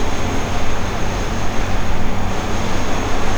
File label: detonate; Dystopia